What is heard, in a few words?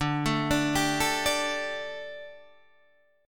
D Suspended 4th D, down, Guitar Standard; arpeggiated